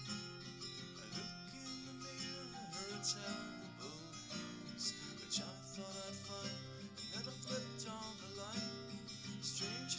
nodrums liverec 250ms 5cm sim

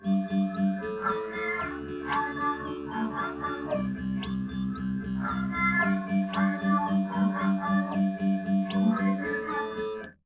nodrums 130ms 4cm, underdetermined; SiSEC11

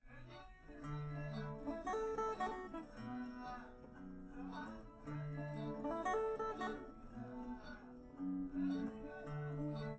nodrums liverec; underdetermined; SiSEC11

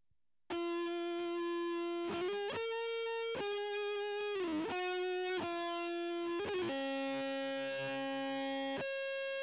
nodrums inst src

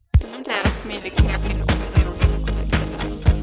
music

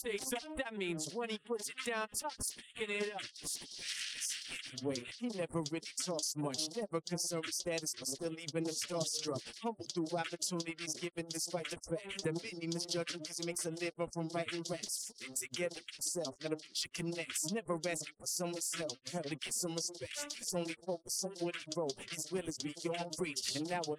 vocals; SiSEC11

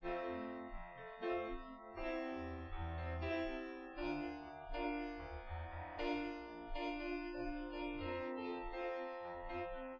nodrums inst sim

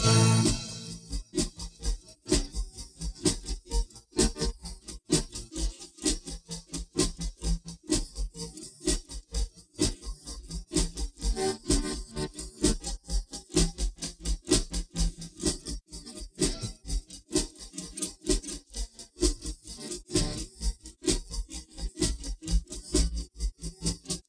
drums

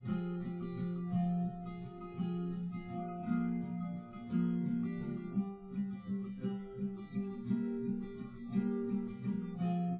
no drums live rec 5cm sim; SASSEC07

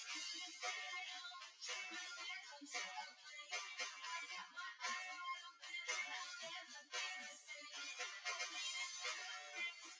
wdrums; SiSEC08; SiSEC underdetermined

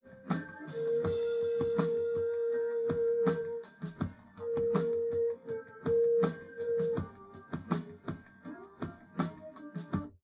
drums; SiSEC11; underdetermined

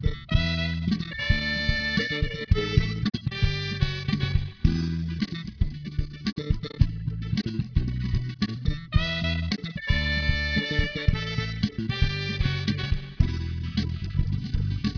mus

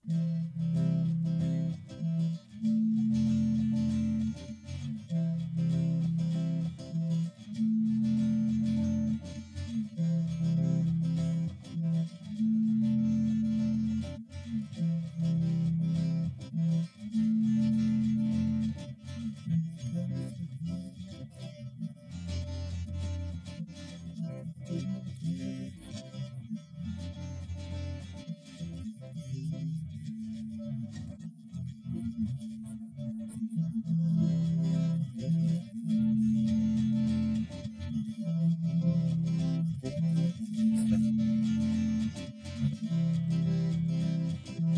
guitar

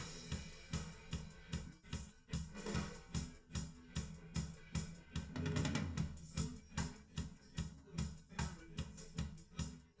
drums, live rec

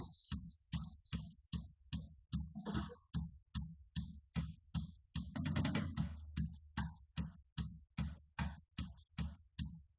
wdrums liverec; SiSEC08; SiSEC underdetermined

